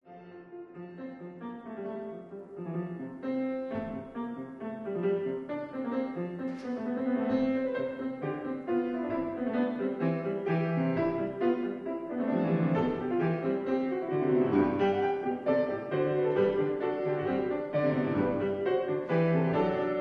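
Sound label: Trumpet Concerto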